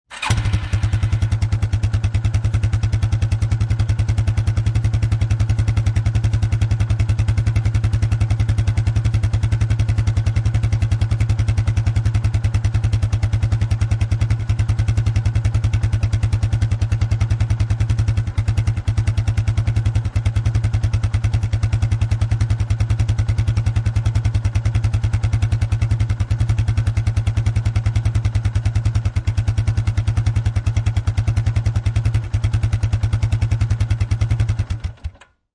Quad Bike Start Up Idle Shut Off Exhaust Perspective; Quad Bike. Motorbike, motorcycle type Quadbike; Lynne Music